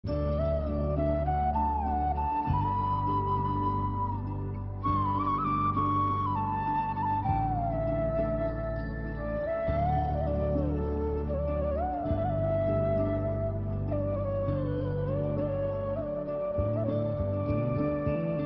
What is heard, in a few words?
enchanted flute cover; krishna; mind refreshing status